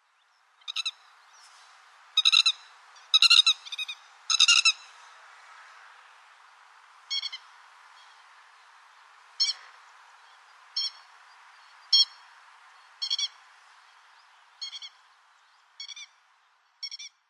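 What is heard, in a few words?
keri, Grey-headed Lapwing; himeji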